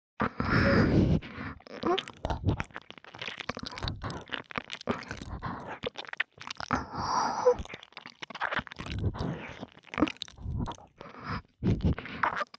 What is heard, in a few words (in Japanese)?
(右) 激しい耳舐めエッチ, ASMR; 黒耳; 効果音無し版